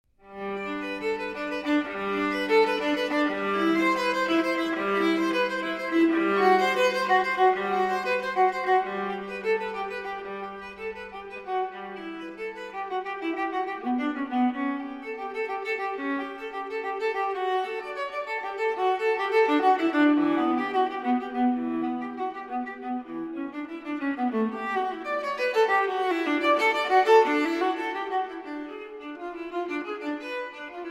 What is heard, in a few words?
Cello Suite No. 1 in G Major, for Viola, Prelude; J.S. Bach; BWV 1007